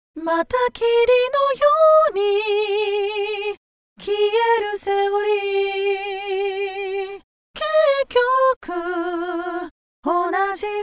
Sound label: Cho Wave Cut Consonant